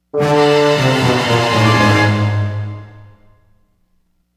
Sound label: Shrink String; Tornado Outbreak